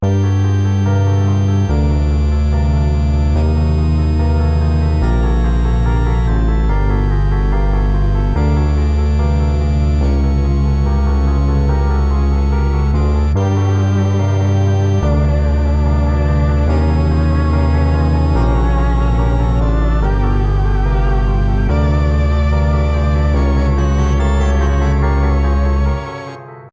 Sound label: Menu; Turn-Undead series